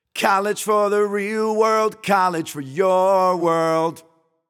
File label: Vocal Singout Only